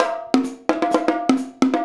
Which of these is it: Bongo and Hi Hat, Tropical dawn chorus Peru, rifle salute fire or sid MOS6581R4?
Bongo and Hi Hat